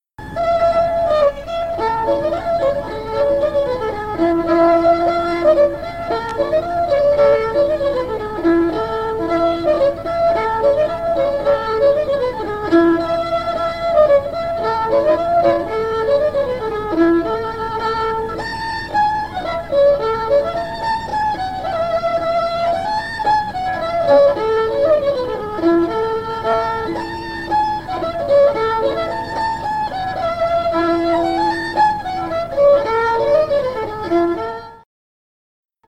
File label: fiddle; SeamusGrantFestival; tune tutor